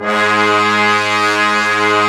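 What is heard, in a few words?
Brass Ensemble mute; Roland L-CD702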